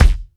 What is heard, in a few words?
SYNTH BD, DANCE KIT